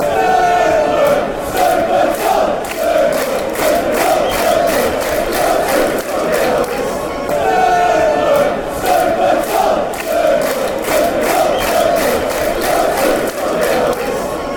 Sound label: chants; super tom